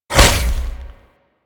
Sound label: Shoulder Barrage Fire; Enemies; Infested; Warframe; Femur